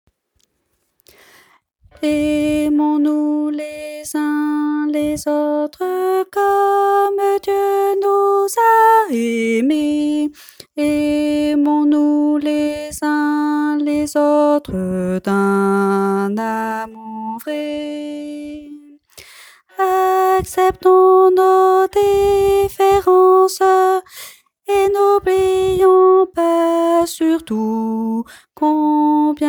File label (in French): basse